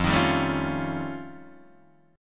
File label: F Augmented 9th F Guitar Open D, chord